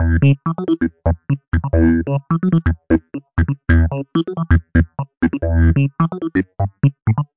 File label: 130 electric piano Emin Dor; KEYS ORGAN PIANO LOOPS